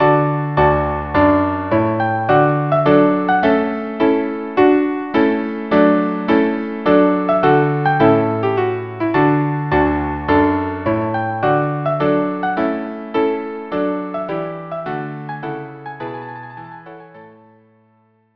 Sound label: violin; tpt tune